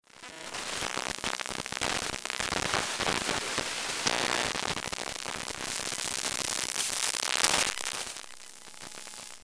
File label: Laptop glitches and noise